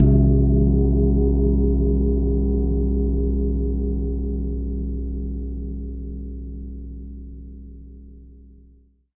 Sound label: Long Gong Single Hit; ETHNIC and WORLD PERCUSSION; Longevity Gong by Amani